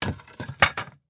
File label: Weapon Axe Drop; AdobeCC